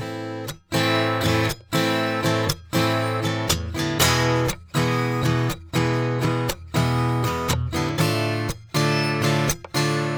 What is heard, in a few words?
Menu; beat-td